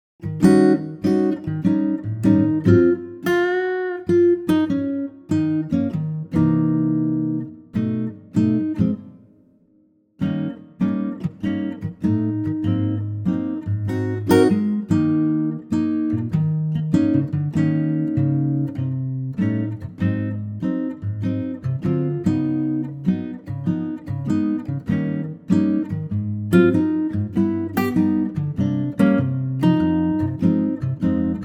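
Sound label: Eb acoustic guitar; vocal range - Eb to Bb; Superb acoustic guitar arrangement of the classic 12 bar blues; female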